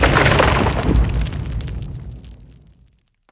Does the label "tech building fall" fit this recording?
Yes